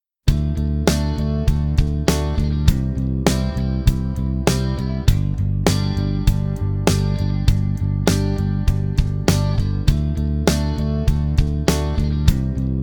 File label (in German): typ akkordverb dur v iv i